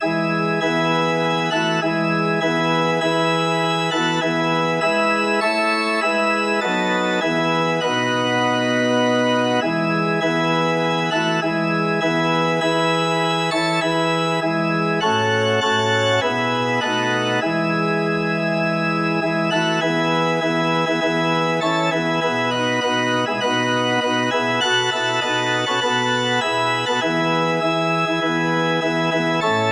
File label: at the cross, organ